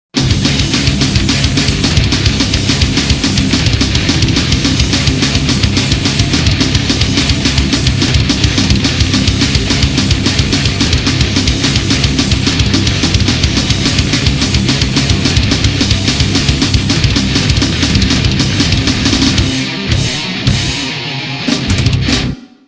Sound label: speed metal